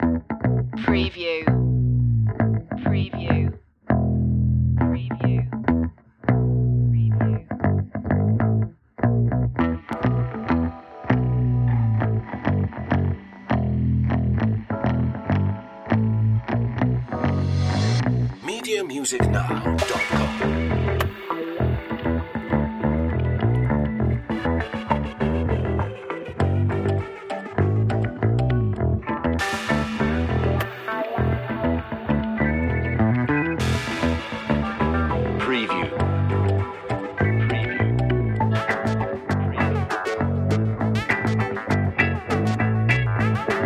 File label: feel the funk no drums